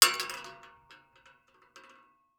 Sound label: Weapon Bullet Shell 12GA Dropping On Metal; AdobeCC